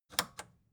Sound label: record player power click on slow